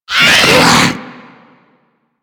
Infested Ancient Grapple Vocal, Enemies; Warframe

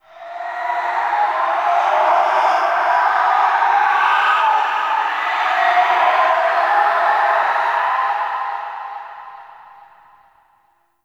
Death Wind Gust High; Ambience; One Offs; Red Night

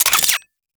Modular Secondary Clip Mech, Weapons

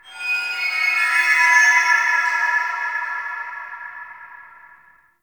Root Cave Magical Chimes Two E; Ambience; One Offs; Neathuns Root Cave

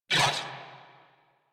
Pain Grineer Male; New War; Narmer

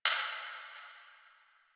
Distant Glass Breaking; Warframe; Ambience; Nineteen Ninety Nine

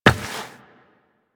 Step Rock, Footsteps Walk; DE; Warframe; Tenno

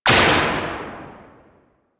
Archwing Mach Gun Tail; Warframe; Weapons

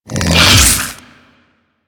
Melee Swing; Enemies; Infested Big Ashen; Warframe

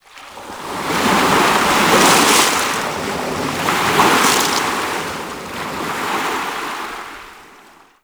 Ocean Wave Crash; Ambience; Warframe; Grineer Ocean

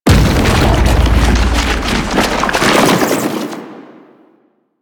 Octopede Lash Limbs Slam; Warframe; Man In The Wall

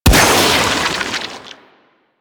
Inf Sniper Rifle Shot, Infested; Warframe

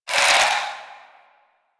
Scarab Swarm; Warframe; Mummy

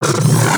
Arch Gun Alt Charge Up; Weapons; Warframe; Archwing; Hildryn

